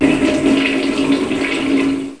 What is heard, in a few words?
battle; batword